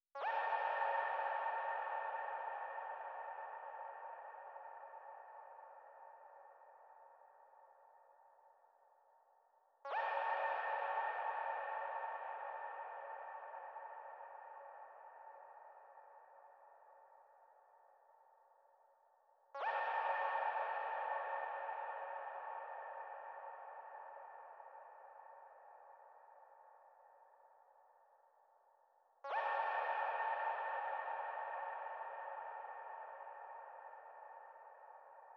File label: 99 BPM A# Min Ambient Shout; Loop Stems; Cymatics; Infinity Melody Collection; Feeling Good